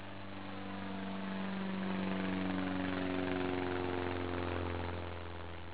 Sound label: Plane